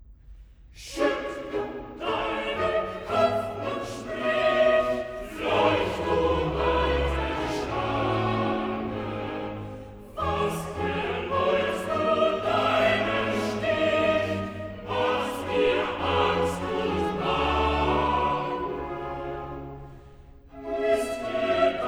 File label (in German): Choral; Cantata, BWV 40 'Dazu ist erschienen der Sohn Gottes'; VI. Choral 'Schüttle deinen Kopf und spri; Bach; New York; Xmas Day + 2nd Day of Xmas